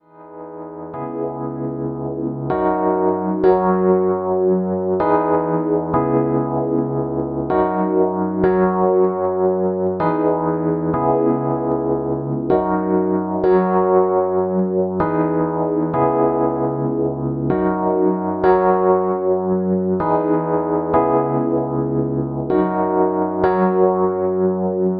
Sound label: Rhodes chord, hip hop chords; rhodes chord 01; dj-tools